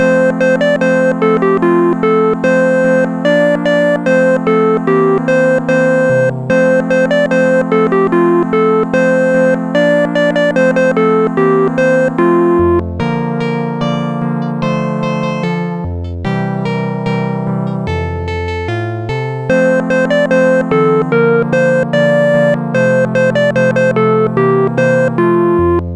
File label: house; Sonix Music Driver